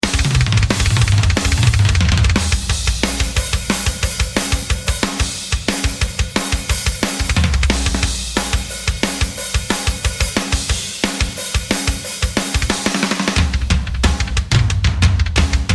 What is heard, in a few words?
Heavy Drums